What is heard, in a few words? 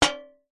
impacts metal tray hit muted hard